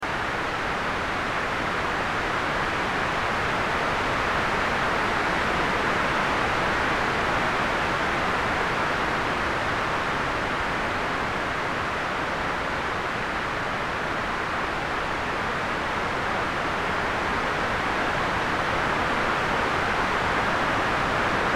Brown Noise, BP 1 62 KHz

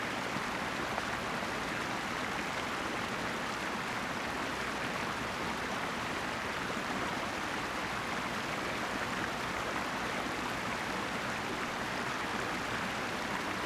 Winds and Oceans

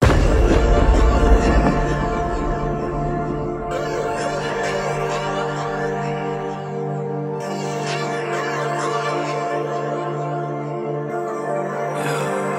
130 bpm d moll

